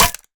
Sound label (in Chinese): 山羊角断裂声